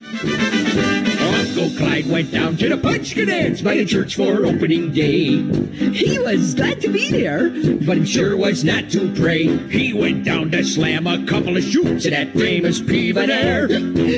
polka

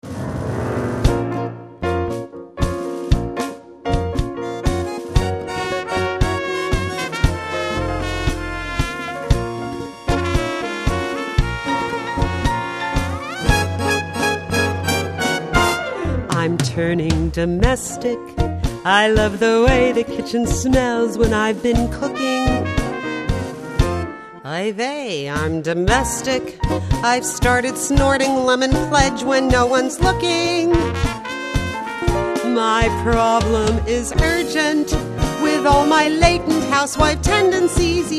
tango

